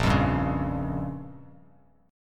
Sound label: A sharp Minor 6th A sharp down, chord; strummed; JGuitar